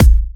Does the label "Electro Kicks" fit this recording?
Yes